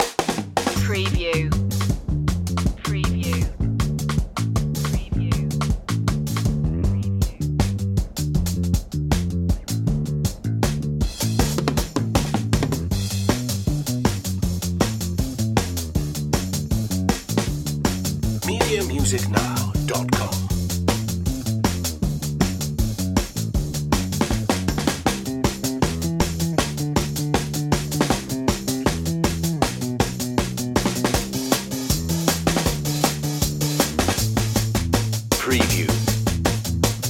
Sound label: ska bass drums